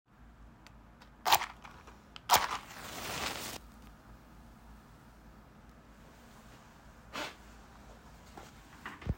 lighting up matches

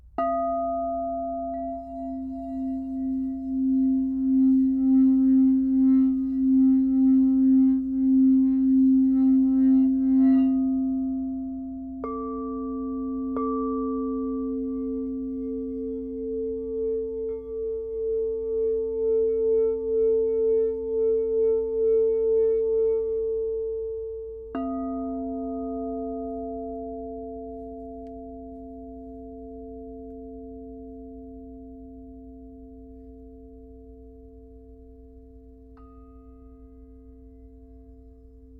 A and C bowls

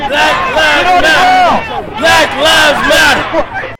protest audio

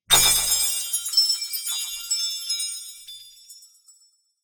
Windshield cracking (possibly)

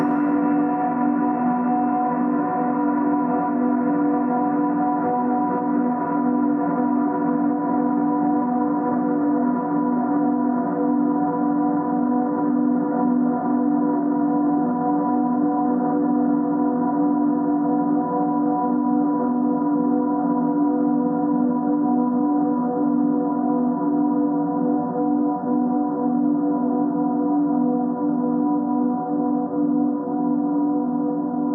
paranormal soundscape pad loop G

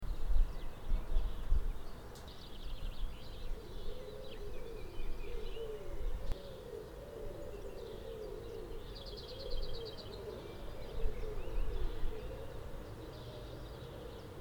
morning sounds

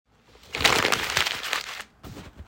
crushing paper

